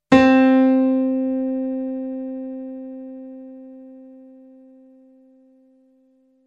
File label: Grand Piano; Sy Grand Piano (Dry and Fx) 1.0